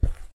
footsteps carpet Walk; Poppy Playtime

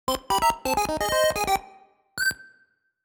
Lose Life; Kirby; Classic Music Collection

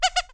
squeaky

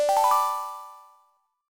Coin Awarded; Pairs Game

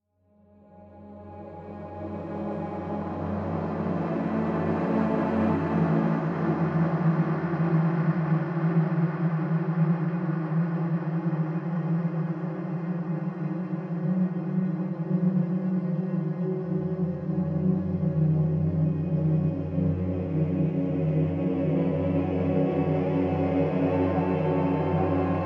Ambient Two Guitar; no more room in hell; windows gamerip 2011

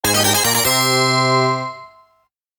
Rank Up Fanfare; lagrange point soundtracks returns

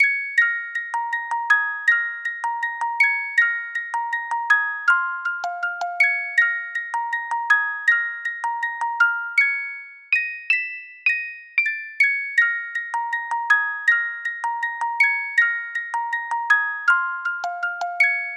Music Box; animal crossing new leaf welcome aamiibo soundtrack

